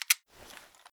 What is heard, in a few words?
safety, weapons brightmp5